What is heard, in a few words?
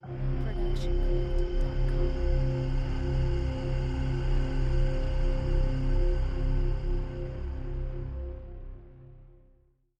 Suspenseful Ident; soundscrate